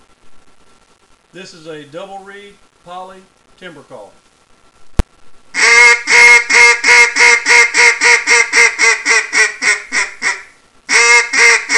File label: Echo DRT Duck Call